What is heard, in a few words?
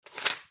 Page Turn